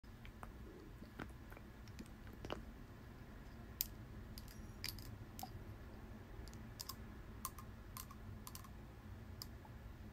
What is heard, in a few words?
dropper; قطره چکان چشم